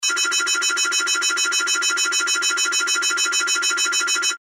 Alarm Jet Missile Lock Alarm Fighter Plane; Military; Warfare; Alarms

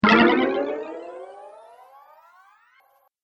Fantasy Spell, magic sound effect

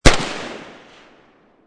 AK47 Bridge; War; VRSonic

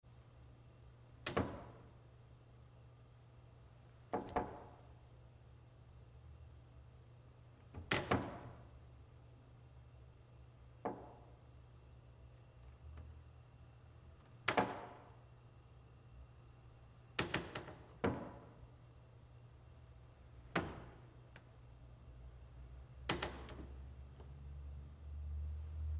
Creaks Wood Church Pews Very Subtle; Ambient; Creaking; Sound Effects:Objects; Big Room Sound

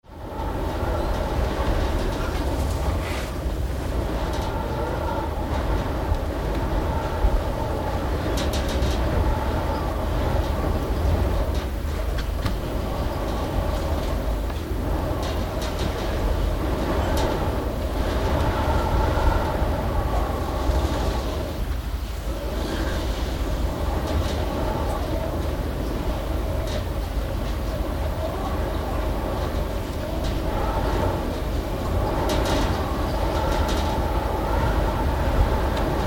Wind Interior with Background Window Rattles; Gale force - Some strong gusts

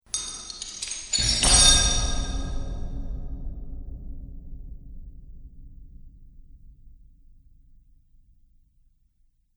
Dungeon Chains Impact, clink, clatter, clang; Miscellaneous Impact Sound Effects; Andrew Fleming

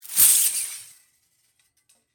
Metal Spool Unfold, Release, Measure, Tape; Industry; Hand Tools; Blast Wave

